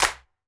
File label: Clap One Shot; Drums